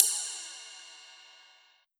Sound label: Yamaha RM50 Ride Cymbal